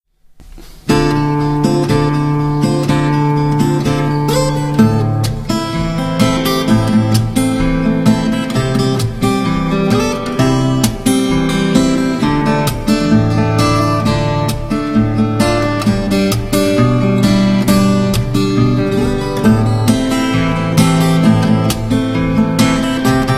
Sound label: guitar; STALKER